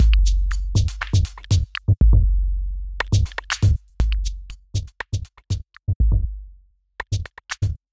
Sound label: pure expander; flux spring pack